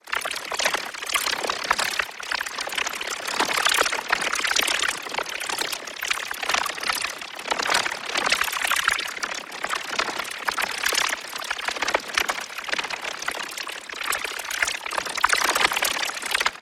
ABILITY CHARGE Sizzle Sphere; boom library; Matter

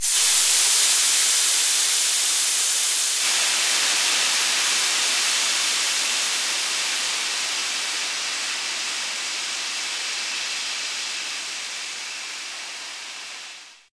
Steam; blindside

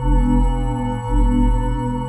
Sci-fi Hover Bot Loop; SFX Kit; Sci-Fi Loops

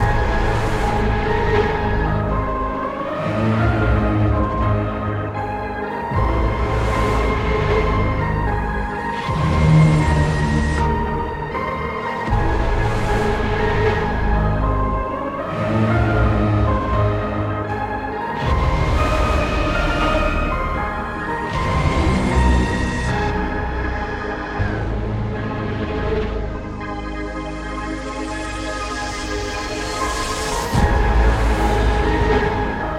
loop; Blind Drive

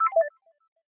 USER LEFT; skype

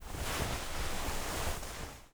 FABRIC Movement Long; mono; Universal Sound FX; CLOTHING

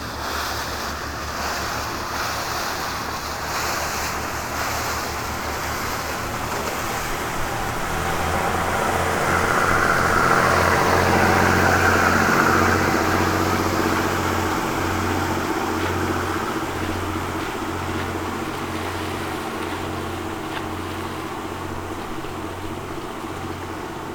boat motorboat left to right; best service Blue Box; sea traffic

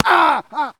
Male Hit By Pitch Baseball

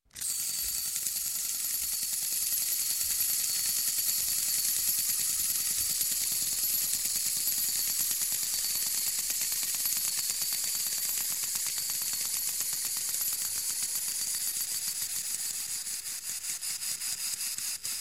Toy Wind Up Toy With Bell; Sound Ideas 2000 Series

